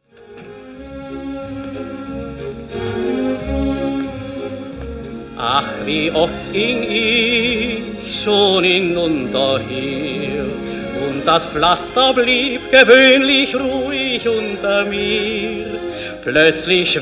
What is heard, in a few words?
sung; My Fair Lady